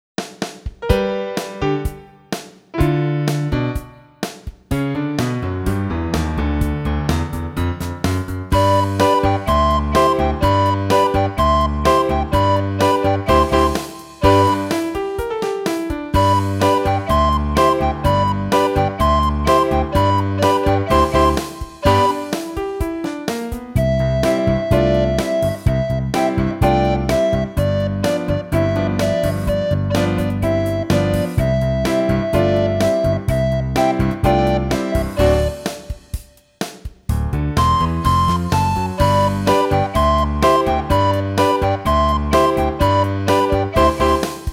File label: Rock Performance Tempo, recorder; red hot recorder tutor